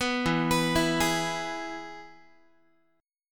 E-Major E, Guitar; arpeggiated; JGuitar

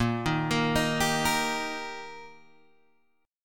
G Minor Sharp 5th, A sharp, guitar chord

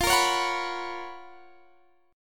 Gb Major 11th

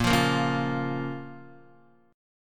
Bb Minor Bb down Guitar; strummed; JGuitar

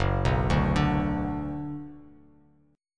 Gb Suspended 4th Sharp 5th, Bass Standard; arpeggiated; JGuitar

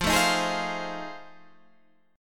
F 7th Sharp 9th, Guitar, Open G

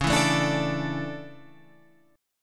A Suspended 4th, C sharp

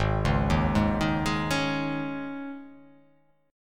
G sharp Suspended 4th chord, down; arpeggiated

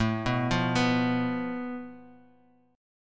A Suspended 2nd Sharp 5th; arpeggiated; JGuitar

